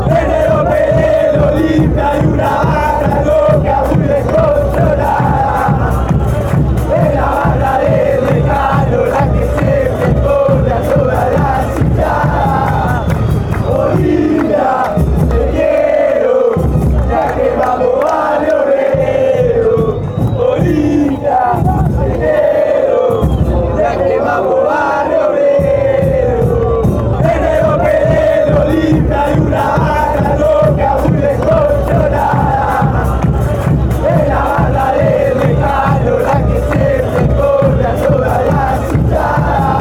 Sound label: club olimpia chant, paraguay